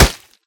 sword hit; Minecraft dungeons

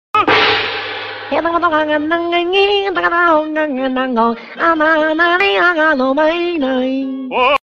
Stereotypical Chinese song

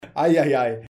eieiei

